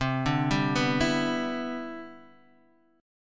E Minor 7th C

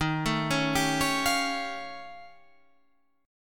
Eb 9th Flat 5th, Guitar, Standard; Eb9b5; arpeggiated; 11,12,11,12,14,13; JGuitar

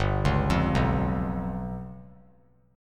G 7th Flat 5th; arpeggiated; JGuitar

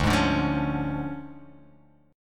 D Minor Major 7th Flat 5th, D

